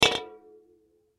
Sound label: Can Set Down Soda; Set Downs (Sound Effects:Objects); Blastwave; Foley